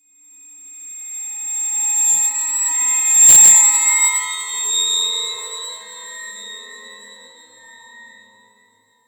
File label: Derelict Creepy One Off, Ambience, Interior; Warframe; Orokin Derelict